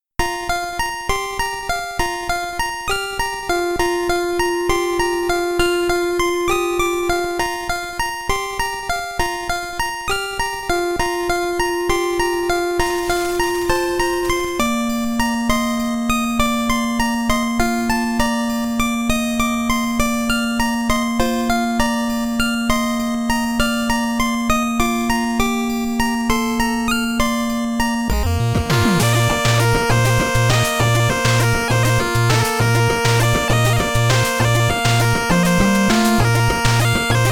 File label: Chiptune Remix